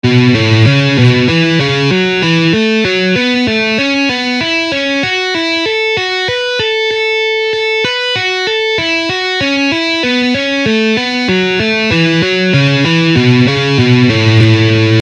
Guitar scale exercises

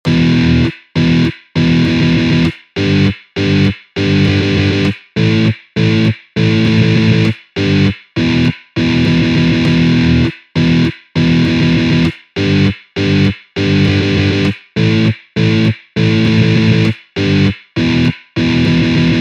Electric guitar riffs

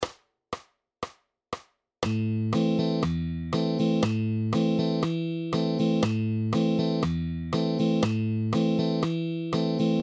Rhythm guitar exercises

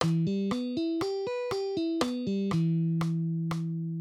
Arpeggios on guitar

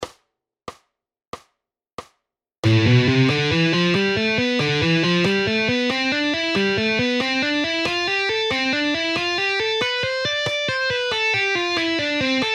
Advanced guitar exercises

